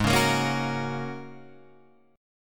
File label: Ab 9th Suspended 4th Ab down Guitar Open G